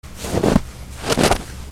FOOTFALL

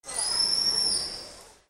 ANIMALS

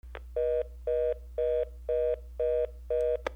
PHONES

